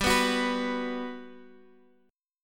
G Major 7th G down Guitar Standard; GM7 strummed

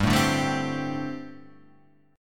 G Suspended 2nd Suspended 4th, chord; Gsus2sus4 strummed; JGuitar

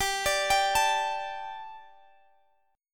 D Suspended 4th G, guitar

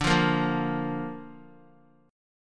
D Minor Sharp 5th D Guitar; Dm#5 strummed; JGuitar

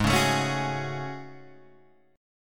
A Major 13th, guitar

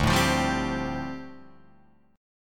D Minor 11th D down Guitar Open F; Dm11 strummed

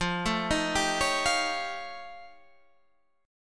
F Augmented 9th F

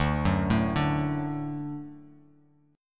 D Major D down; D arpeggiated; JGuitar

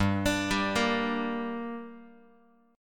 G Minor G down Guitar, chord

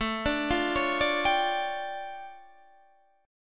D Minor Major 11th, A

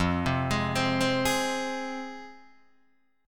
F Minor F down Guitar DADGAD

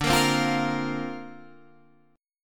C 7th Suspended 2nd Suspended 4th D

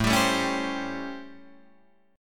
A 7th Sharp 9th, Guitar, Open D; 7,10,7,7,10,10

